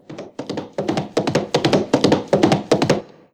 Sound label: Galloping Horse